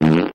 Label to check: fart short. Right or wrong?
Right